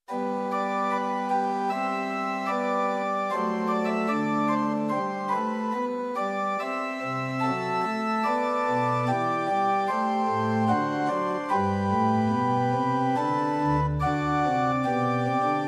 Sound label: Short Organ Music Pitch 440 Temperament Equal; Prinz 8 4; hymn